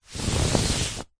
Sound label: household bedsheet movement fast single rub hand flatten